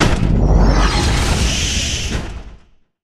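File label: Deep Heavy Impact, stinger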